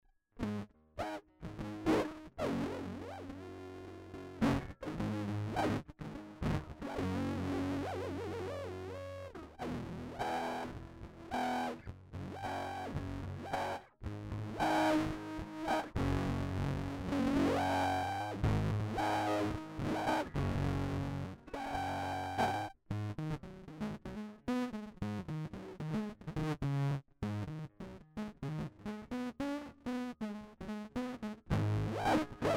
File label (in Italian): acustica, freqbox moogerfooger; Chitarra acustica Martin DC1e; VCO: 0 SYNC: si WAVE:tri ENV AMT: 0 FM AMT: 0 MIX 5 con cavo ENV OUT-ENV AMT IN e pedale espressione; MF-107